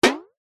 cartoon metal hit impact ascending tail

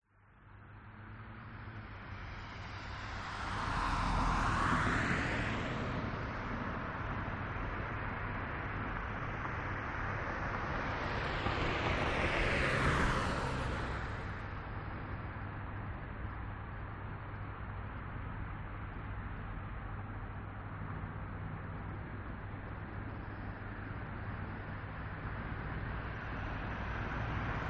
Night Boulevard Ambience; west wolf

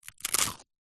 human eat bite crunch ice cream cone

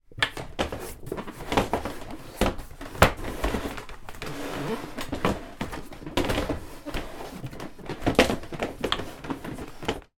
dining chairs movement laminate floor

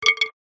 impacts wood small pole drop

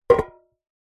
tin metal cylinder lid on set down on concrete

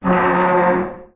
foley metal scrape large heavy; zapsplat

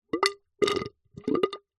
water gurgles short weak; nature

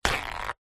kitchen towel paper rip perforated; foley

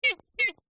leisure toy squeaky squeak broken

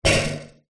household fan desk metal basket cover bash; zapsplat

